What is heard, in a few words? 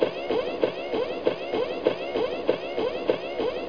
legs run